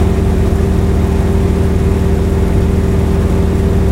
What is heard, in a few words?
yak cockpit, engines; War Thunder